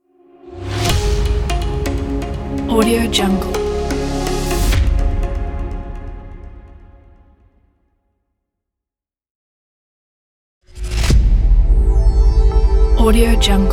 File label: logo reveal; boxbeats